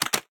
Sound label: Button Select